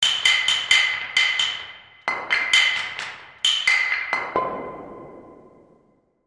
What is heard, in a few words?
bricks; eShop